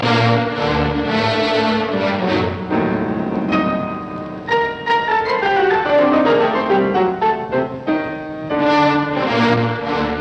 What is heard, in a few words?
Piano Concerto No 1 in E flat major III Allegro marziale animato; Claudio Arrau